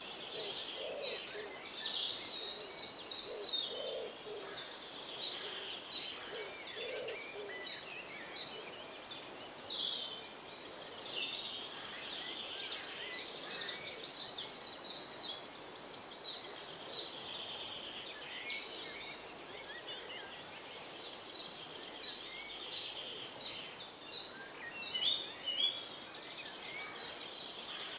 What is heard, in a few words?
FARM YARD AFTERNOON